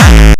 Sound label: kick